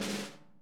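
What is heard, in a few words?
snare buzz; Sonic Reality; Interactive Drum Kits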